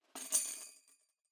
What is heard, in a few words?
Tambourine Noise